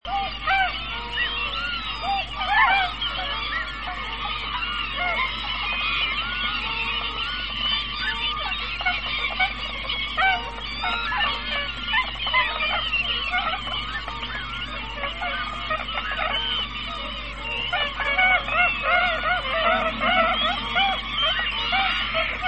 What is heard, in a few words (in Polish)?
Łabędź czarnodzioby; Cygnus columbianus